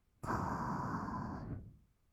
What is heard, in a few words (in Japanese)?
吐く音, ブレス; ASMR; 1200 kawaii voice!!